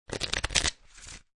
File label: Cards Playing Cards Shuffling; Playing cards, poker, shuffle. Shuffling cards; Casino